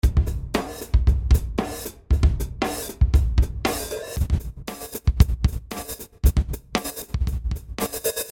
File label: tremolo